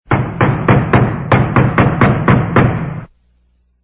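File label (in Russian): стук в дверь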